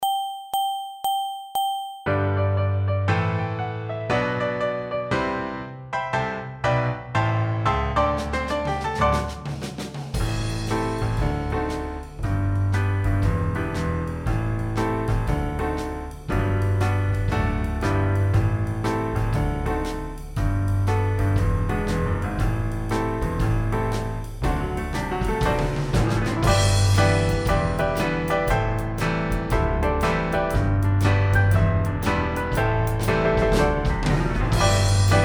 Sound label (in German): Playback G Dur Streicher Gitarre